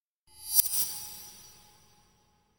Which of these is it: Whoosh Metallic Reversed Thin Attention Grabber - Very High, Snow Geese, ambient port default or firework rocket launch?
Whoosh Metallic Reversed Thin Attention Grabber - Very High